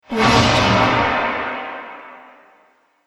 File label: whoosh media fx